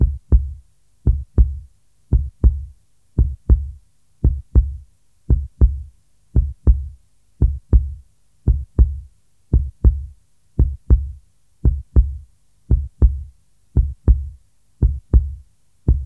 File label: human heartbeat 58 bpm